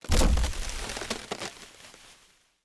foliage break; teardown; ps5 windows xbox series xs

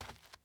dirt step

